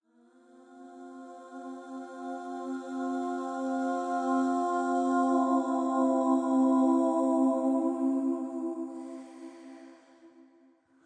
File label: Interval toning C F